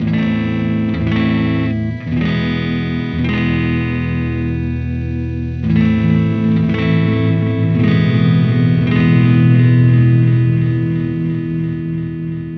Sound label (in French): effet delay